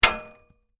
Metal Hit Rock Impact With Metal, Basic small metal impact